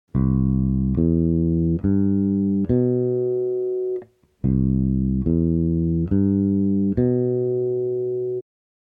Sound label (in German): dur septakkord